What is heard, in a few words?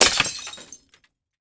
glass break